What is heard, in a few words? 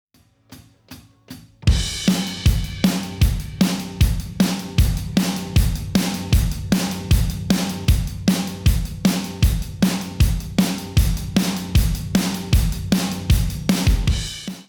drum buss